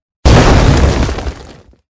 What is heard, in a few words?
attack; mingwang